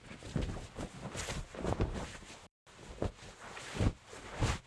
Leather Movement

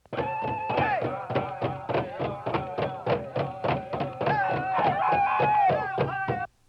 Native American Song With Drums And Yelling

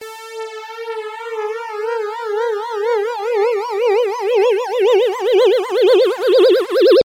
Ship Sound Design

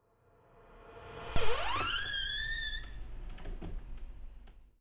Haunted Door

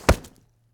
Body Fall

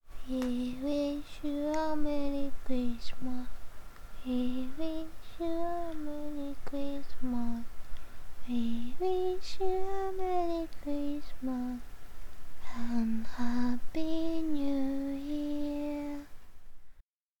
We Wish U A Merry Christmas

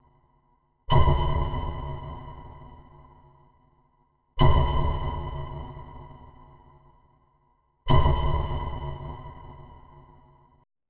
Ping Impact

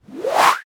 Swish up medium

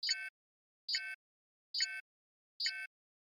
Glassy Combine Multi Tone Alert Short Signals; Ui alert

